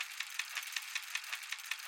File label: Safe Rotate Back